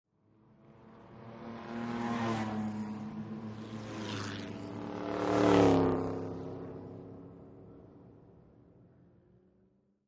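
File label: Vintage Race Car; By 3 ( Car ), Vintage Race Cars close up Fast Bys R / L, Shot close up From The Track; 1914-1954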